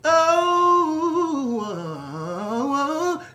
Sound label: Run 21 notes oh oo wah